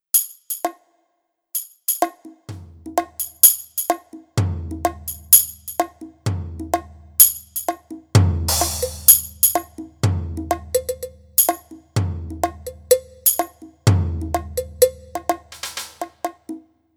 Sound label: Millenium MD 100 Mobile Drum